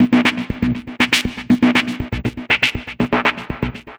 Techno Synth

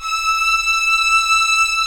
STR VIO; AKAI S-Series CD-ROM Sound Library VOL-2

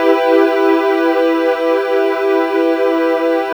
SYN DANCE, Roland; Sound & Vision - Gigapack II

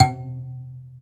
Bass Kalimba C2

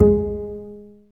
STR CB JZ, Cb JazzPizz; Roland - LA Composer vol. 1

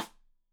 Side Stick Stereo; Deeply Sampled Drums; SMDrum

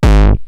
Roland TR-909 BD; BD Selection

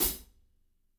CHH, Burning Grooves, SLAM BALLAD; Spectrasonics - Burning Grooves CD2

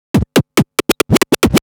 Scratch Fills 140BPM

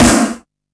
Snare, Drum & Bass; AMG - Prototype Drum & Bass; Snares

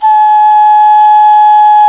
D OCRNA, CLAYFLUTE; Africa; Spectrasonics; Heart of Africa 1

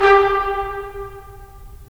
BRS, Tps Stacc; Roland - LA Composer vol. 4

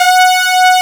SWEET BRS; East West - Global Sounds; SYNBRASS

